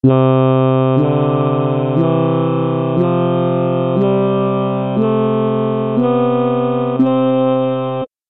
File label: scale JI